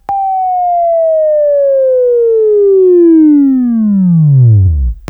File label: WAV Right, Sweeping; Sweeping Training program